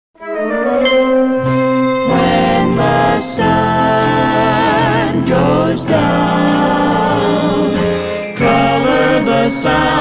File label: Luxury Listening; PAMS